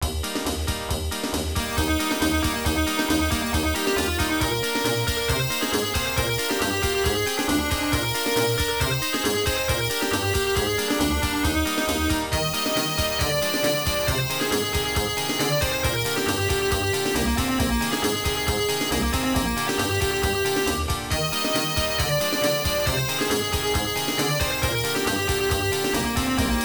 Bonus Stage BGM, arcade; Wally wo Sagase; System 18

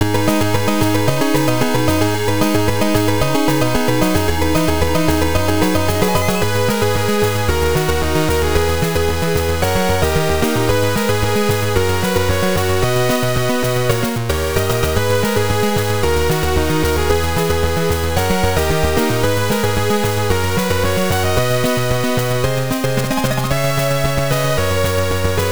Trap; Kaze no Densetsu Xanadu; The Legend of Xanadu; pc engine cd

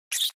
Rat; Peace, Death

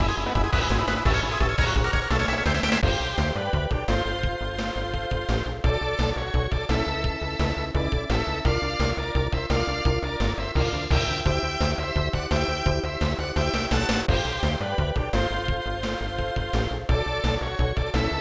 Goal; F-1 Grand Prix Part II; SNES

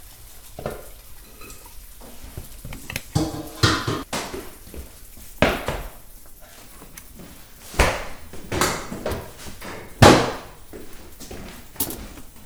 cooking; Lucius III; pc linux

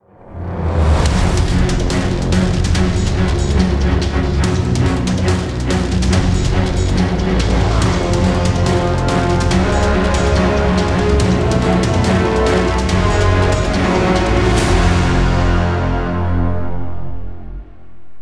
Stinger

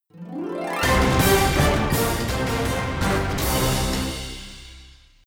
Card Epic Stinger; Zak Storm Super Pirate; mobile

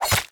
VAMPIRE ATTACK POINT

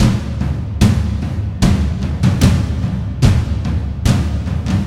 March - Percursion Loop

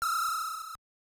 laser; Gradius; MSX SCC

